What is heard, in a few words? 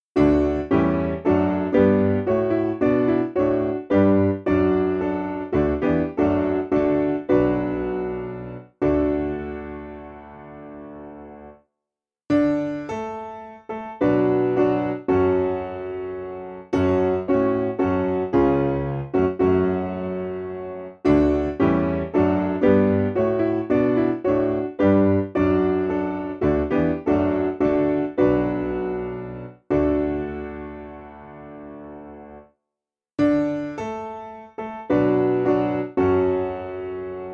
Piano